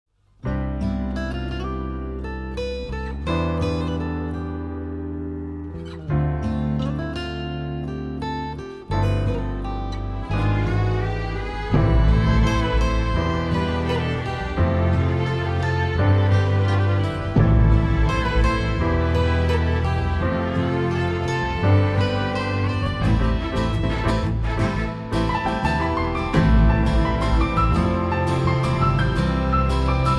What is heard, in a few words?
remo emotional bgm